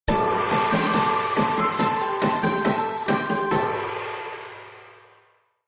Bigwin; Lucky Wok

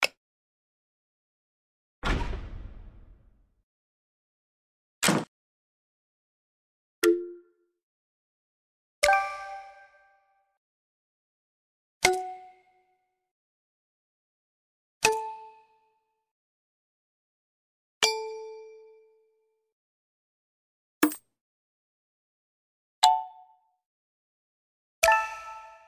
Game Sounds; slots; Pirate Quest

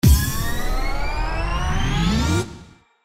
ANTICIPATION WILD; Zodiac Millionaire

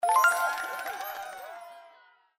Win; slots; Majhong Royale

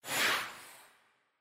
reactor initialize; SLOTS; Cyber Angel

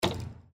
Reel Spin Stop; Valentine Blast

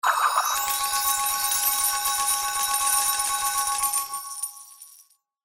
Jackpot Animation; slots; House Of Luck

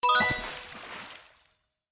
Bonus Click; FinWin

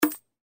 btn Standard select Coin; slots; Pirate Quest

